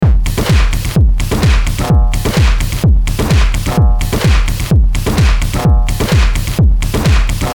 Techno beat with field recordings full groove with compression and bit crushing